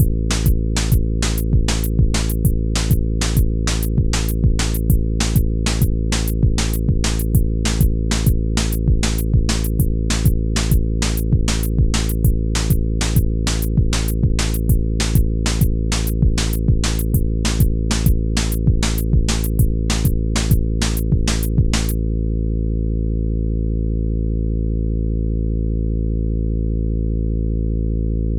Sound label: shatter beat